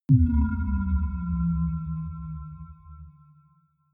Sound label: sci fi bell dull